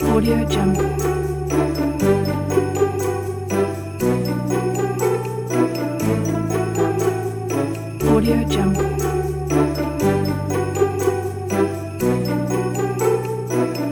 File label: children christmas loop